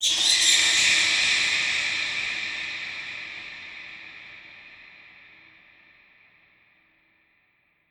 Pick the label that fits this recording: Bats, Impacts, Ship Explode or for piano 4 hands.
Bats